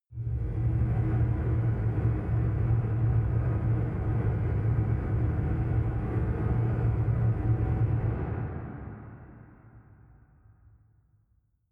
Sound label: machine room dark